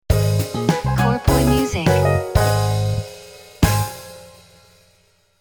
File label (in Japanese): jingle ウォーター